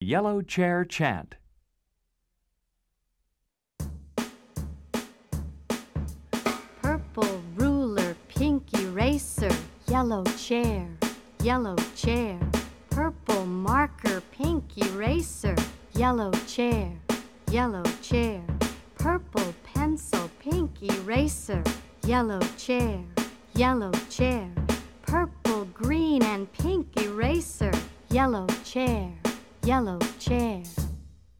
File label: The Yellow Chair Chant (solo); Let's Chant, Let's Sing 1